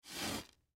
Cereal Crumbs Wipe, Cereal Crumbs Wipe Off Wood Table Food; Household; Blastwave